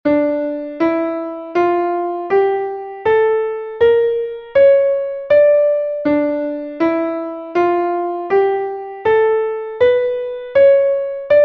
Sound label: scale of d harmonic; Essentials of Music Theory: Elementary